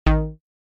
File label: Subtle Tweet